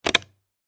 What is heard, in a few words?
Switch Plastic, Switch Click; Foley; Switches; Objects; Blastwave